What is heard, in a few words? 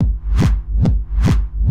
DRUMLOOP TEKNO 140; Techno2